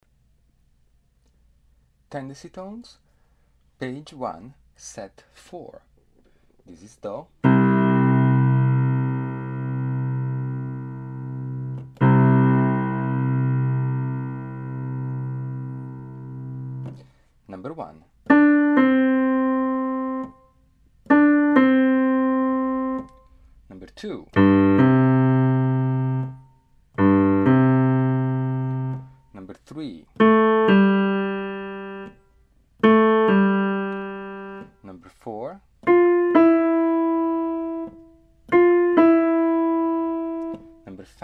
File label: tendency tones